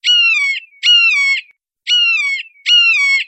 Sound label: hawk call